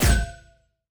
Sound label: Heal; MiSide